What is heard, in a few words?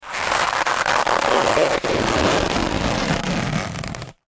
Foley Balloon Rub Long; Balloons; Cartoons